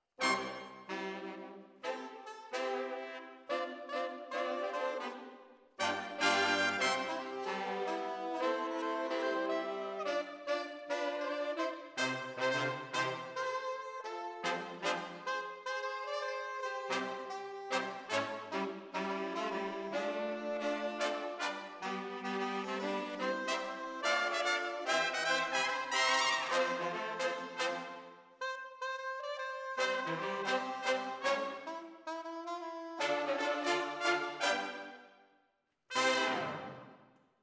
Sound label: ORTF, Jazz for piano